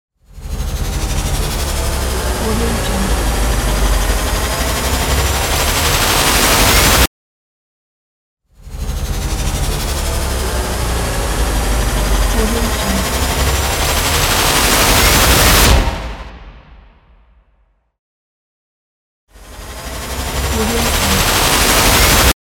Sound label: tension building up